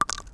sand; Mafie 1

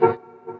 horn; Mafie 1

